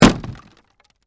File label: Impact Wood Hit, Sledgehammer, Debris; Hammers; Tools; BlastWave